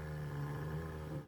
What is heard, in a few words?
cat groan; 2fast2curious